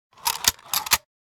office credit card; NCH Library

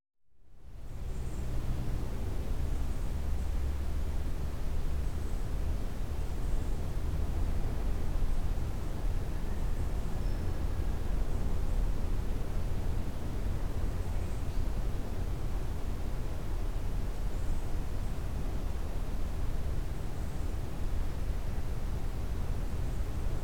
Room Interior quiet distant birds; Quiet Rooms & Other Worlds

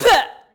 Boxing Female Spit Mouthguard

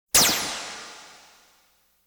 Science Fiction effects, Lasers, Light beams

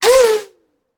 Bullet Pass By; Sound Ideas; Guns

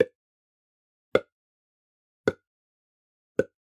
Organic Mouth Pop; boom library; The Interface

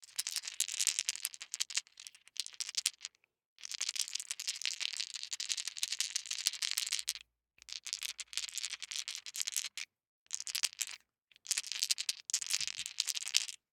DICE in One Hand, Shake, Four Dice; Samples; ArticulatedSounds; DICE Sfx Party (Extended)

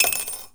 Coin Toss Into Tip Glass; Foley - Rattles

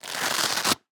Defibrillator; Medical; Blastwave

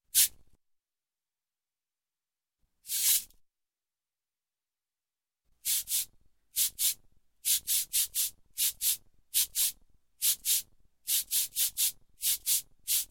Cabassa one Scrape, Music, Percussion; Sound Ideas; Series 2000

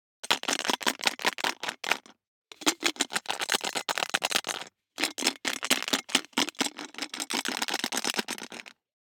DICE in Plastic Cup Closed, Shake, Three Dice; Samples; ArticulatedSounds; DICE Sfx Party (Extended)

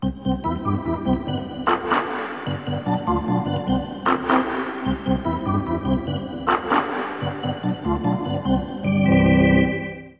Hockey Organ

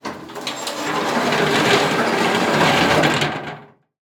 Hospital Garbage; Medical; Blastwave

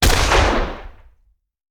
Shotgun; Sound Ideas; Guns

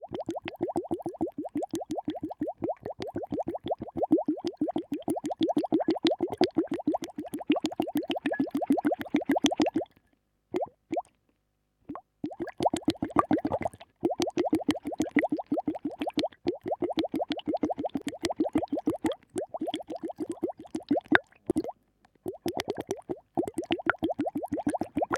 bubbles; liquid; NS Studios - ultra sound collection

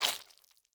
step gore; DeadFright

